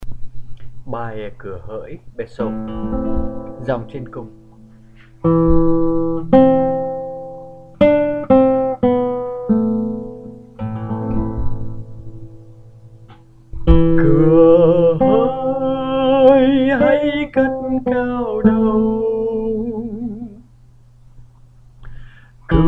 soprano

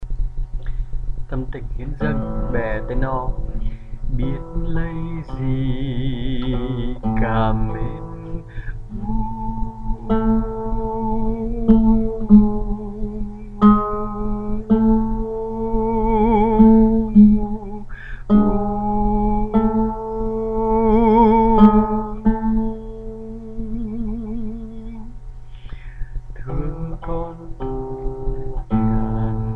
Tenor

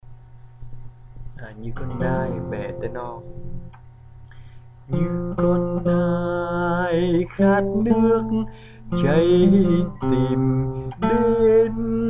Tenor

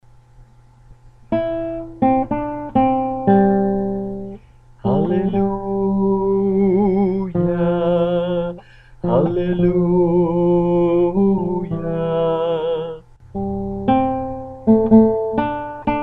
Alto

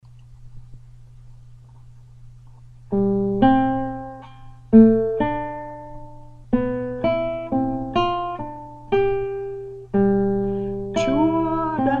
Tenor

